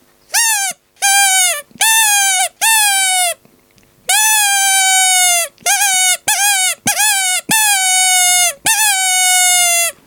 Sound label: Fox Blaster Coax, fox caller; T2 Black; 3D printed fox caller